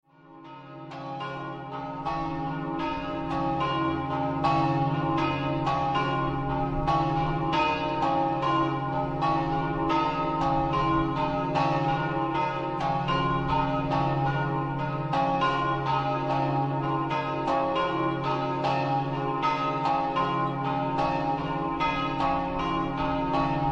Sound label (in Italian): Basaldella, campane